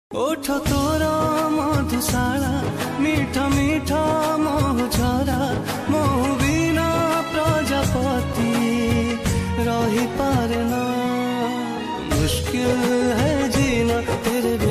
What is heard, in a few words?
Odia Song Ringtone